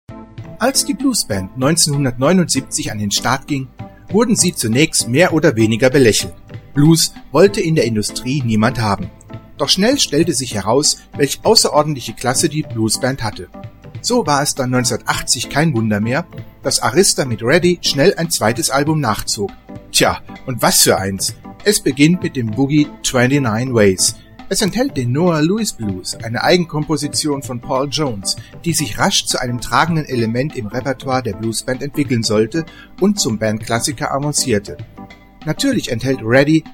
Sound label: Blues Band